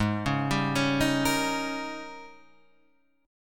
Ab Major 7th Suspended 2nd Suspended 4th Ab, down, Guitar, Half Step Down